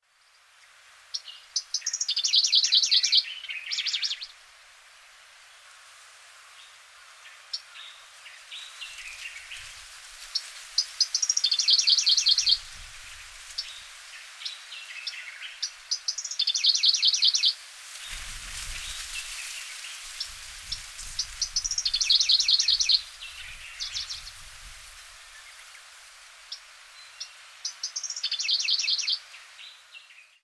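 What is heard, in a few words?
Middendorff's grasshopper warbler, rishiri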